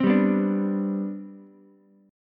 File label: C Major 7th Suspended 2nd C down Ukulele, chord; CM7sus2 strummed; JGuitar